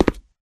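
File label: Grunt Movement Footstep Walk Concrete; Titanfall 2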